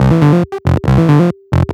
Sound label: bass 138 F, bass-licks, Techno; instrument-loops